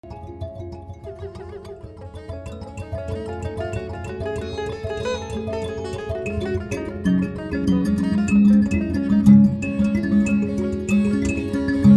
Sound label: mbira and sitar; array mbira and sitar